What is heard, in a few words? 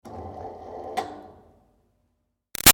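Projector Screen Medium Far Down Short; Offices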